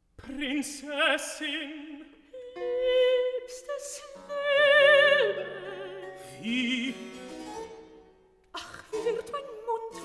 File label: Recitativo; Prinzessin!